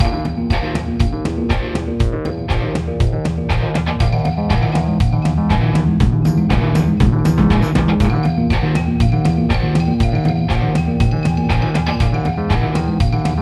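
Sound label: Jack fight Music Loop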